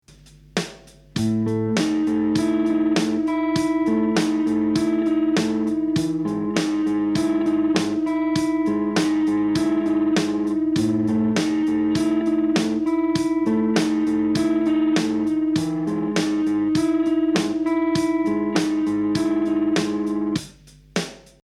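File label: full speed, rock guitar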